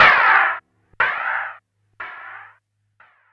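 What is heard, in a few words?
PARKY snare drum, drum loop; Big Fish Audio; Uncivilised G'rooves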